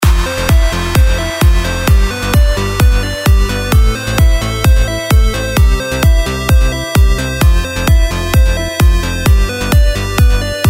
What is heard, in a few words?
Positive Energy Party; SafeMusicList